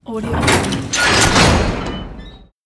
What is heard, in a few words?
metal door open